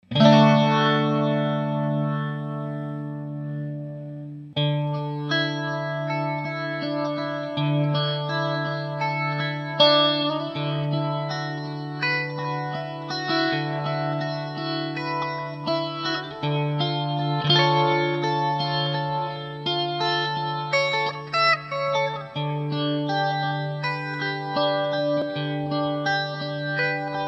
Boss RT-20 setting 2, RT-20 Mode 2. Leslie plus Marshal amp sim.; Boss RT-20 Sound Clip 2 - RT-20 Mode 2. Leslie plus Marshal amp sim.